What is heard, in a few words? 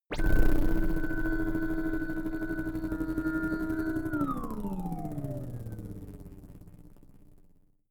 engine shut down glitch; energie